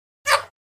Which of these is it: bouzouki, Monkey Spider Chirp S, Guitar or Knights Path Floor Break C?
Monkey Spider Chirp S